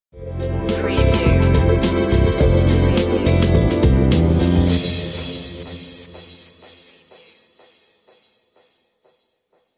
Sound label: watching time passing by stinger; media music now